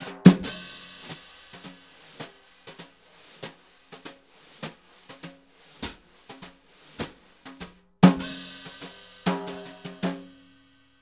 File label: BRU, BRUSH; Univers Sons; Jazzistic